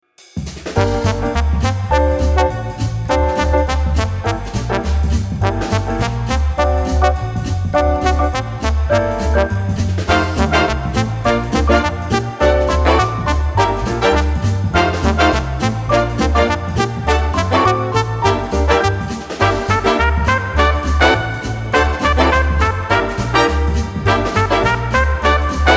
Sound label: comedy swing